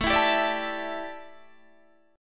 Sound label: A 5th 11th, guitar chord; A511 strummed